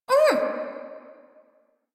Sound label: Pain, Barks; Warframe; Corpus Lich; Persona D